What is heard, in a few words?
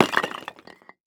Impact Concrete Drop On Concrete Multiple